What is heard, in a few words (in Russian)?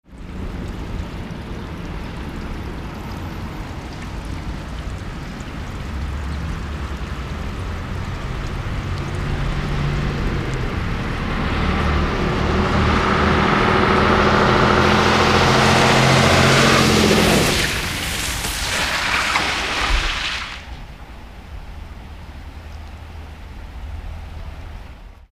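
автобус приближается затем тормозит идет дождь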